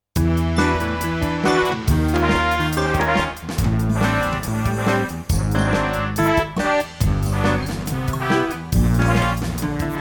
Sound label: Flash Jazz Bash